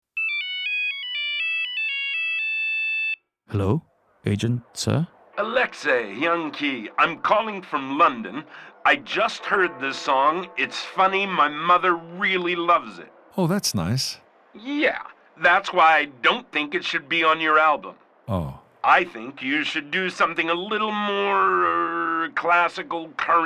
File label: Techno